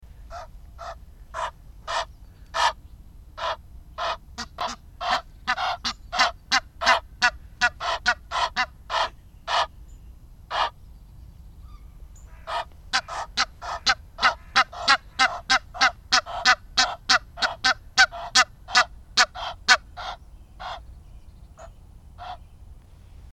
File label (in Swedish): Nilgas